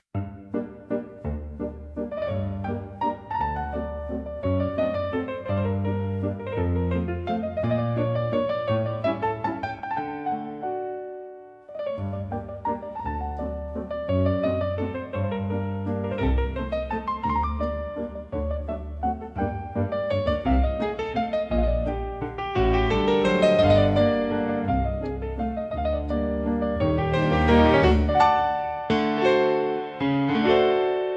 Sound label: Elephantine Waltz; The Elephant; 巨象圆舞曲